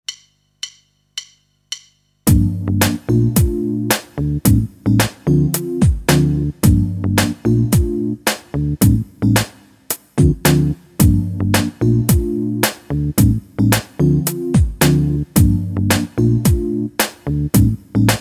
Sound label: Rhythm backing; Guitar Techniques 2017 Issue 274 October